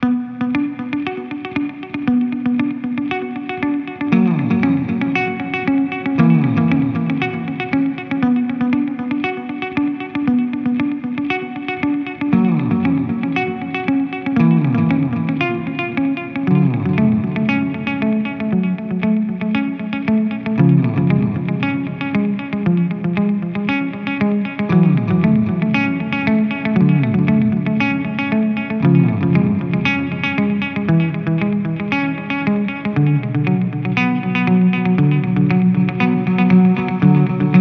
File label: Delay Reverb Pedal; Minim